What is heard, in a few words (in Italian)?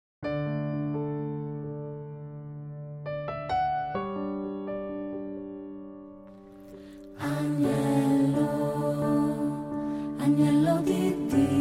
agnello di dio voce contralti